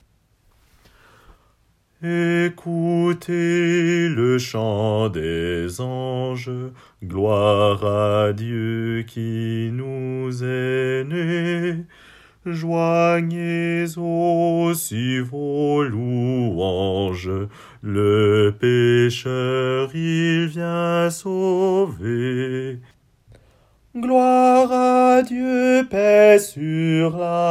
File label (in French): basse; ecoutez le chant des anges